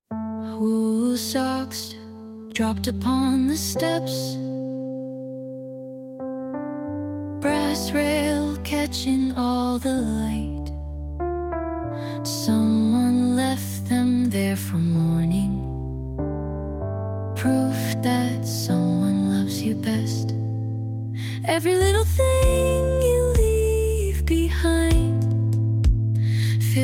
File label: birthday, name songs; gwendoline